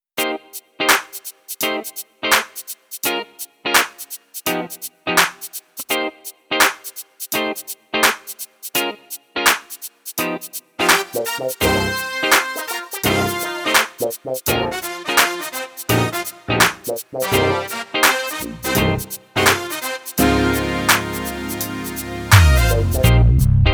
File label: drumless